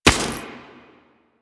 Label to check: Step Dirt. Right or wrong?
Right